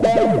alert; hl2; combot

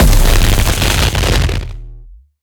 impact; Bluezone Corporation; Electrostatic Field

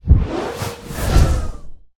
Dark Spell Dark Energy Attack, Druid Magic; Rogue Waves

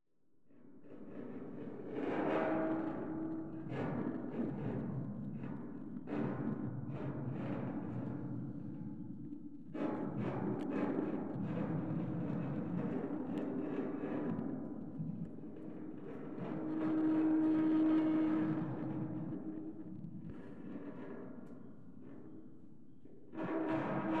Metal plate iron grind; Coll Anderson

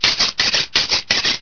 roller sharpen blade; hl2

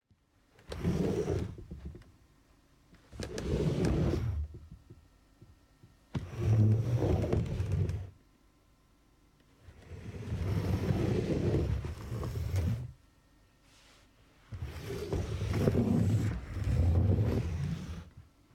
Modern Seating; TheWorkRoom Audio Post

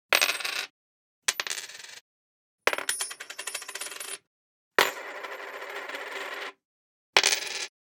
Coin Wood Table Singles Drop Spin

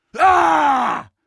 pain, combine soldier; hl2

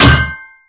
iceaxe impact; weapons; hl2

